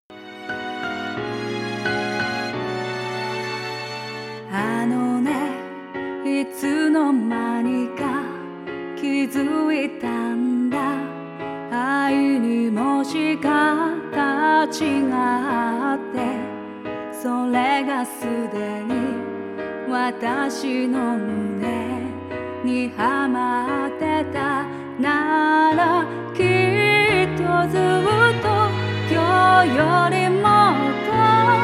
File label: singing mix Delicate Lively